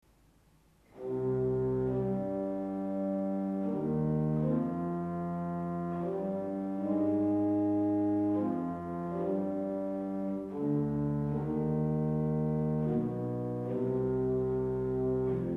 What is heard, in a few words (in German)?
Violon 16 + Cello, Orgelstimmen; Orgelstimmen CD1